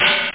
clos hat; da capo vol1; drums misc

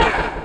Companion Drone Shot; SWARM

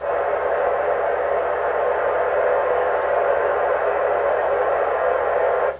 radio; WAV Sound Effects; office

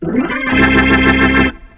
ORGAN; EarWax; Musical fx

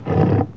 roar

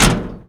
bump; Ballistics; Speeders

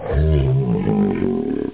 homemade laugh; mortalstix episode1

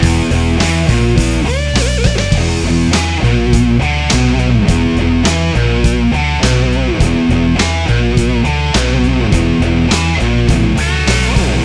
ROCK TUNE; beavis

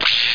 Zap

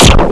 Our Laser; Zone Warrior

